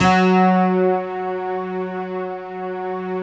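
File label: bright guitar strings, Slow Bright Guitar Strings; Optical Media International - Sonic Images Library; Eastwind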